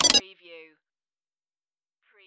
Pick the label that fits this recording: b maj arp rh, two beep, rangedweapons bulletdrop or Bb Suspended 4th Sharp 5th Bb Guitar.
two beep